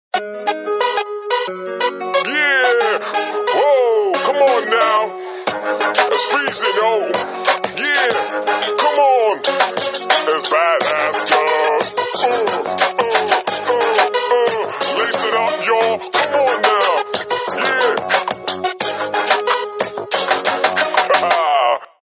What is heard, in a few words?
Hip hop Guy; Samsung; SGH-E251; India